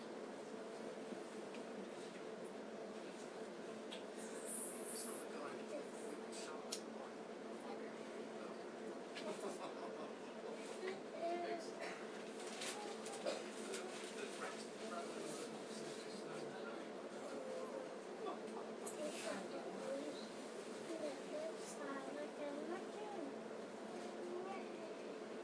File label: on defective train; uksm